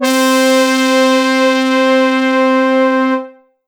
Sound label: Roland JV-2080 MKS-80 Brass C4